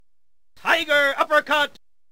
uppercut; tiger

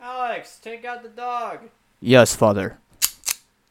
dog

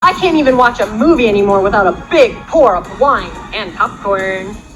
wine and popcorn

